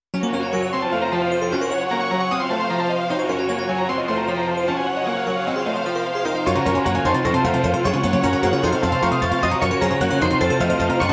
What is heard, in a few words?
SC88Pro